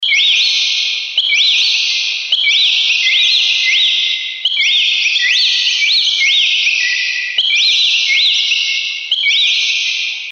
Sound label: birds